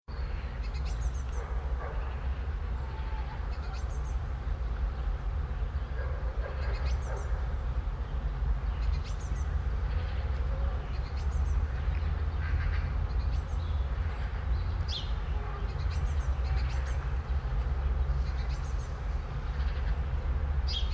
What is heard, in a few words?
Europe Spain City Park Early Heavy Song Birds Distant Dog Barks Summer; Tom Hutchings